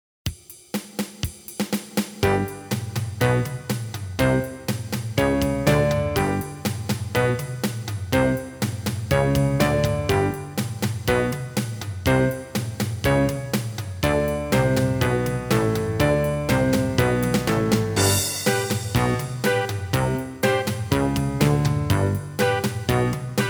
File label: Half Time Band Slow, piano